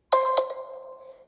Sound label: Marble; S7